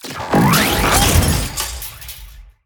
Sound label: Drill Golem Death